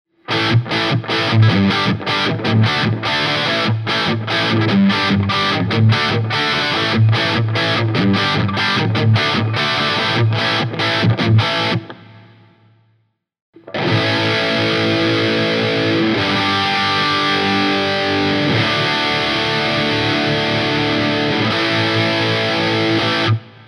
code3c dirty, humbuckers; pickups

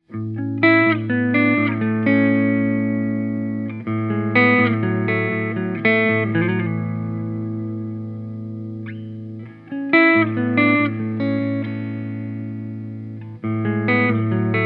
origin clean, humbuckers; pickups

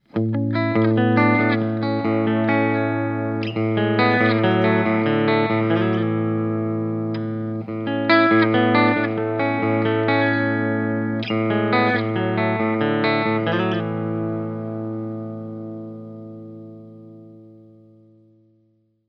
clean, humbuckers; jftp; pickups; phillipspickups

